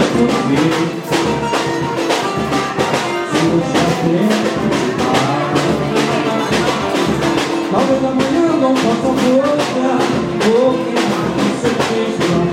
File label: lunchtime samba